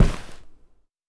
Sneaker scrape

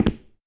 Dress shoe

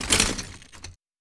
Glass

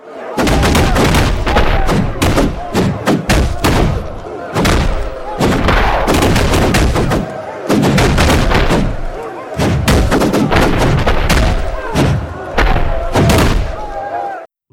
Punch Battle Sound

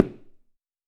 High Heel

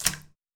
Puddle

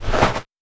Snow

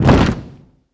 Soldiers

